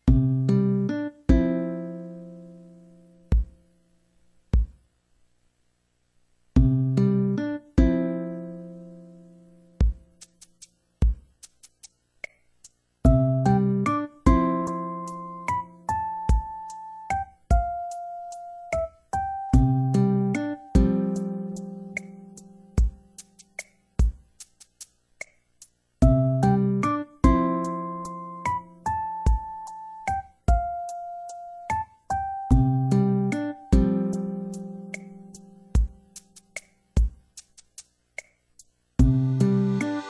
1 AM Music; Animal Crossing City Folk

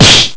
kick low; easyrpg; WitchsHeart

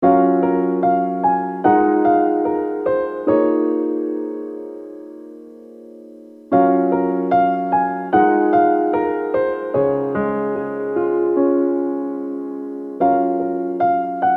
piano; easyrpg; WitchsHeart

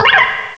Cry

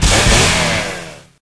LIFT SAW; Full Throttle; ScummVM

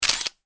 camera shutter; easyrpg; WitchsHeart

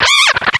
Cry; Pokemon Essentials; Pokemon Iberia V1.03

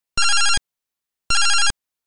Phone Ringging; Pokemon Essentials; PKMN Estelar 1.3

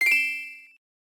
Mining reveal; Pokemon Essentials; PKMN Estelar 1.3

